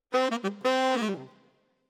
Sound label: Tenor, RNR for piano